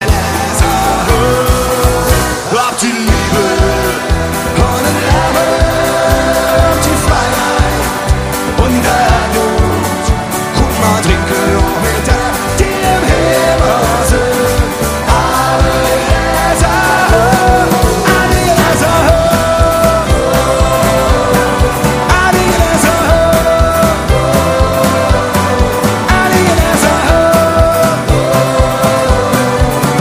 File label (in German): live mit orchester